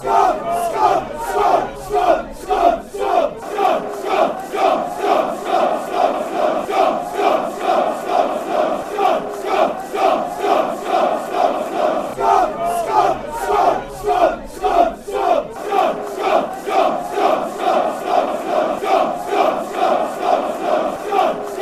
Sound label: scum scum scum newcastle